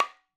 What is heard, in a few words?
madalam notes R; MADALAM 110 7 8; Sample Banks; Percussion; MADHALAM